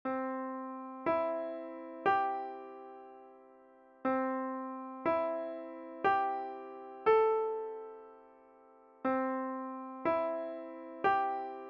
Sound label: chords